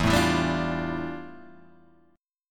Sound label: F Major 13th E, guitar chord